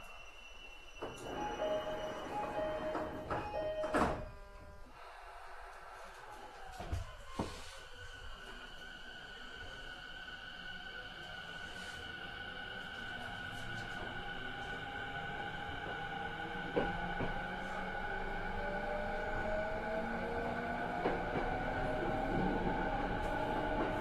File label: yokosuka sobu rapid E217-2, train